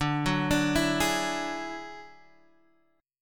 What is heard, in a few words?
F sharp 7th Suspended 2nd Sharp 5th, D